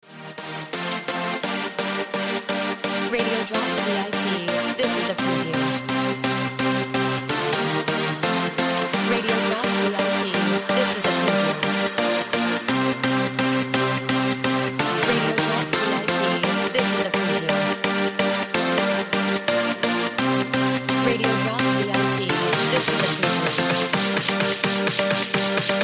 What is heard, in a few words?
Music Bed